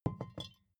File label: vodka put down tipping